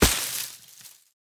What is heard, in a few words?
foliage impact bullet; physics